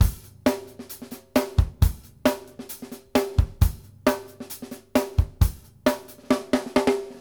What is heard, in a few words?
BASIC A L, Drumworks